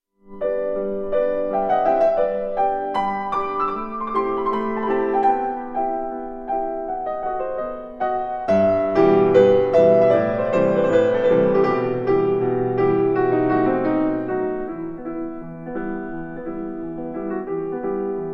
melodie for piano in b minor; Franz Schubert; Ungarische melodie; D817

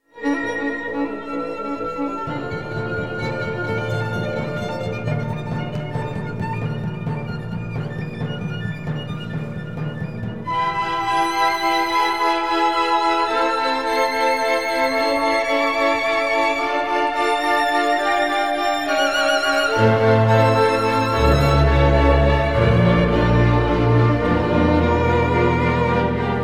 concerto for violin and orchestra

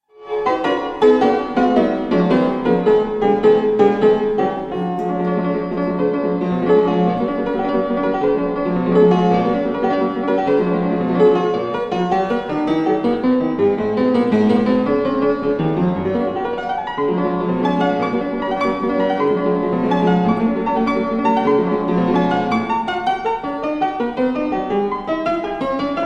piano sonata in c major; ludwig van beethoven; op 2 no 3

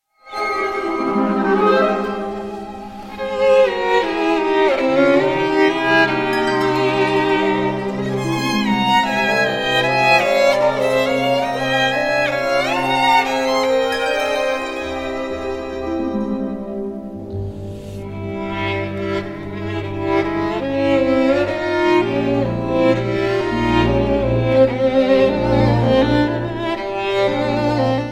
violin concerto; sir william walton

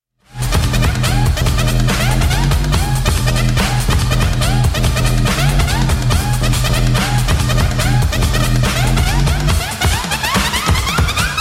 machinedrum alarma

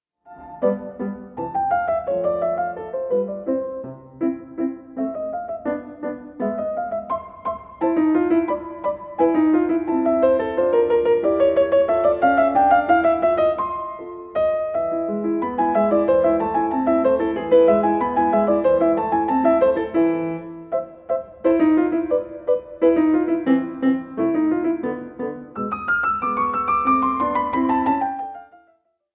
sonata for piano in C major rondo; wolfgang amadeus mozart; 3rd movement

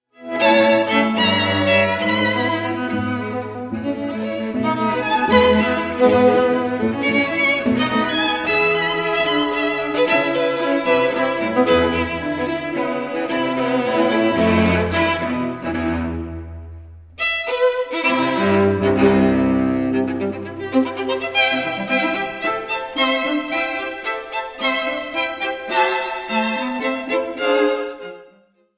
quartet in A major for strings; op 4; 4th mvt vivace e con fu

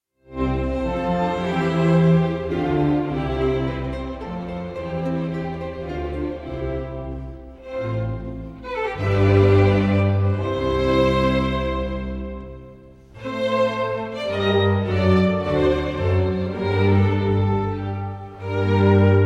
concerto in f major for violin; pietro antonio locatelli i musici; op 3 3

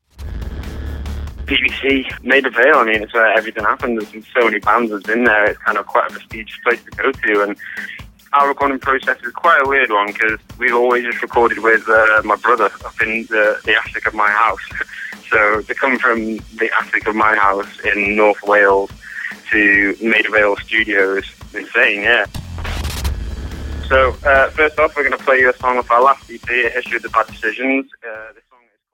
drums

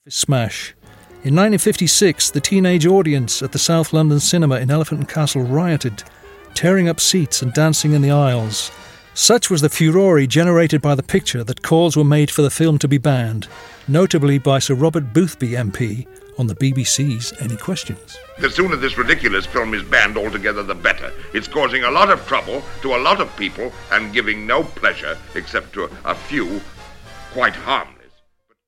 invention for guitar and trumpet

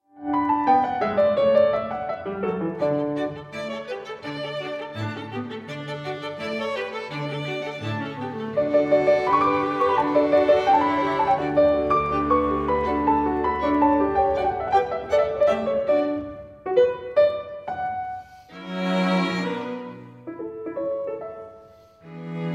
quartet, piano concerto in a maj; wolfgang amadeus mozart; piatti quartet; gottlieb wallisch; piano concerto no 12 in a major k414

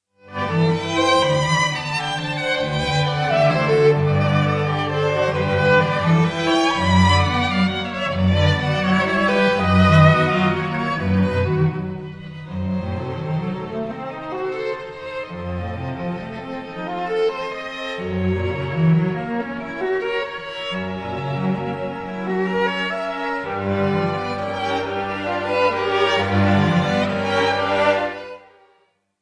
quintet for strings; Belcea Quartet; no 2 in G major op 111

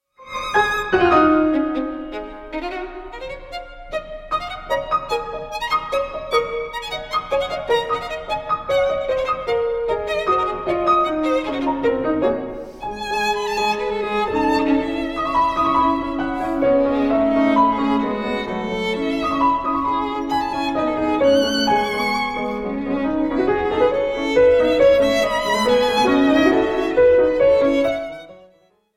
sonata for violin and piano; aaron copland

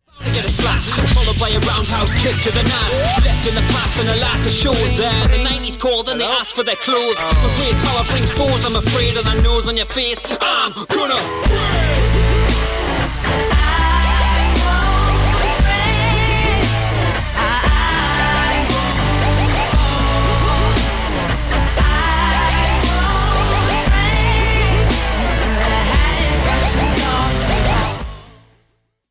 break

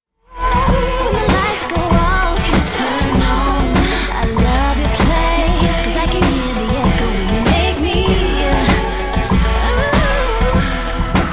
scream

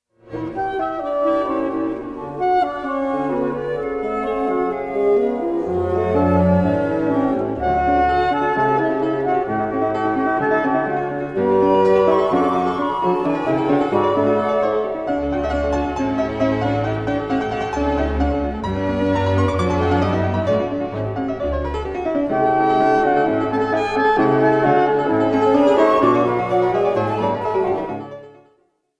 piano concerto in A major; wolfgang amadeus mozart

